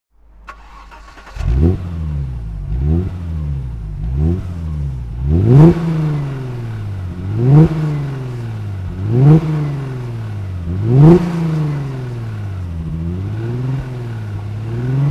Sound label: alfa romeo gtv6 throttle